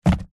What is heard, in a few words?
household book large old hardback set down on hard surface